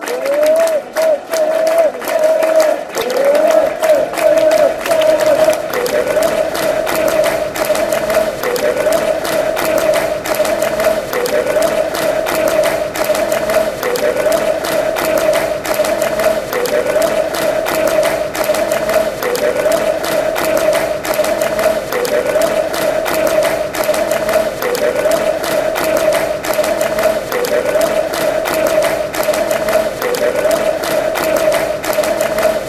de de de clapping

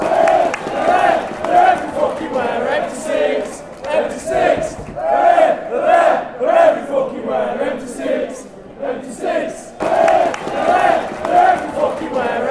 empty seats, fan chants; dcfc

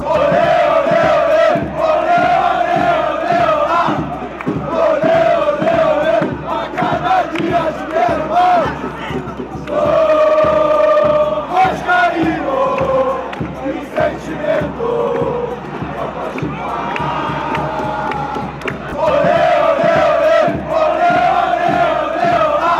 ole ole ole, fanchants

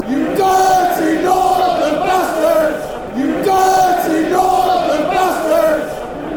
dirty northern bstards chant; brfc

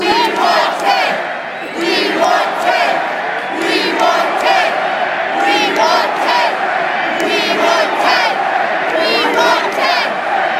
we want ten, fan chants

